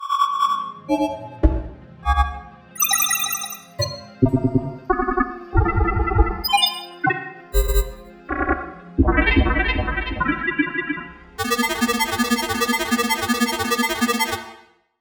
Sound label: spacelab ui samples